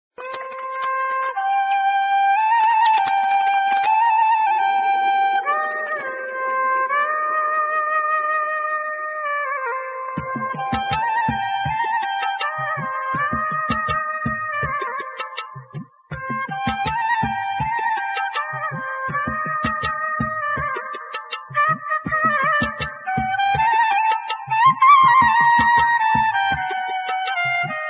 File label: Flute; Hero Movie